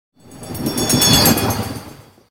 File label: Train Bell By; Hollywood Edge Sound Designers Tool Kit